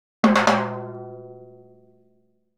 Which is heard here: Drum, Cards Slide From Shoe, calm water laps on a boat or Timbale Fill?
Timbale Fill